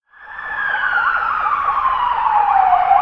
police siren

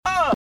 uhhh

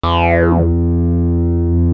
autodarts dart board sound

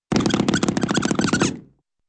squeaky running feet

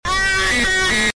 aaaaaaawn

